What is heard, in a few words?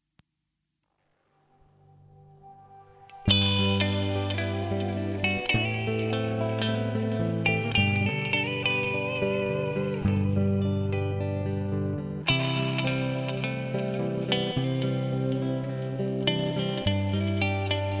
music little palm tree